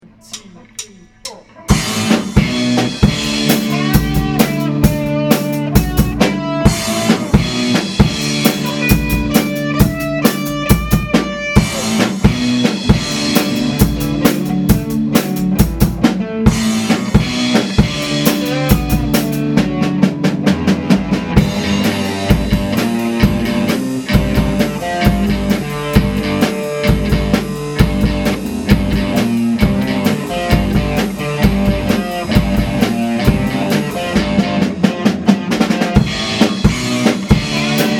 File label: Soloing Modulation; rockbandacademy